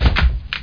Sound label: open door; Duke Nukem 3D Kill-A-Ton Collection